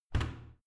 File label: washing machine modern close